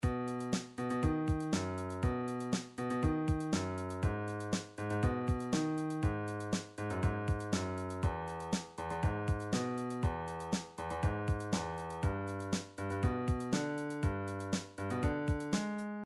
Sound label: drums motown